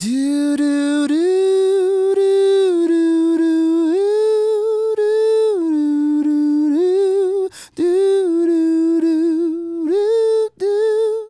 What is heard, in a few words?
Male Solo, Hip-Hop and RnB Vocals